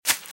Velociraptor - Footstep - Grass - Run - Right

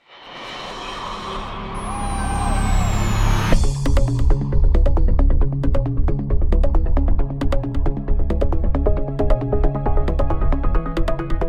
Progressive House

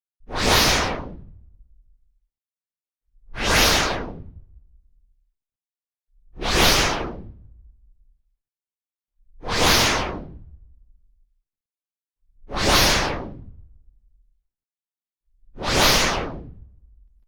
WHOOSH Noise Filter Basic Dull; boom library; Anime Essentials

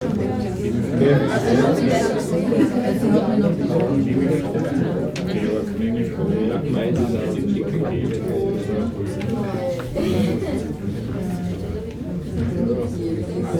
voices coffee, surroundings; best service Blue Box

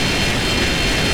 Rocket Missile Loop; Weapons

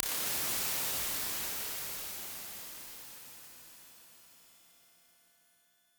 MEGA DIFFUSOR, Impulse Response

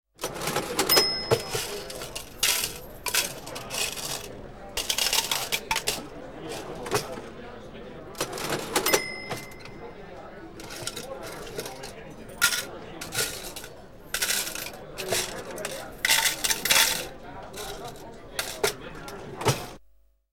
Cash Register With Restaurant Background; KMP Film Video Sound Effects

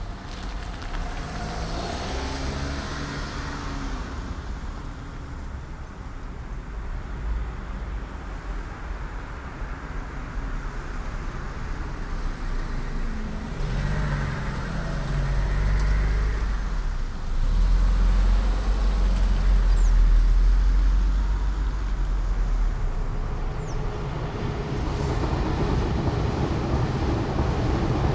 Urban traffic moderate diverse close; urban and suburban; boom library

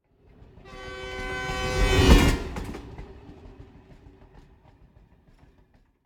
Train Whistle By European - L to R; Hollywood Edge; sound designer's toolkit v2

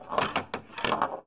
Pinball Spin Plastic Gate

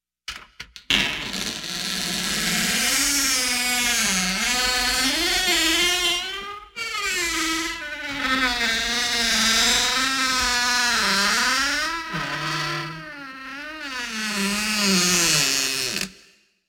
Creaky Gate; Halloween Havoc - Sound Effects; Ktel

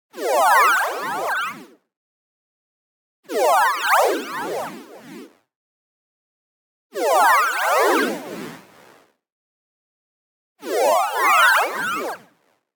tonal, reverser sine saw shift; boom library; Anime Essentials

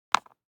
DICE on Cardboard, Drop, Standard, Two Dice; Samples; ArticulatedSounds; DICE Sfx Party (Extended)

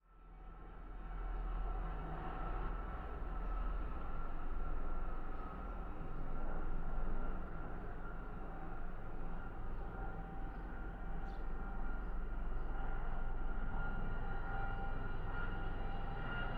helicopter fly by; vehicles; NS Studios - ultra sound collection

